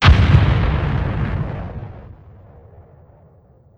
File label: explosion; gfuel